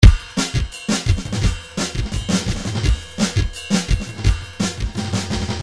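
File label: drums 170 cymbal; Paul Kodish Dangerous Drums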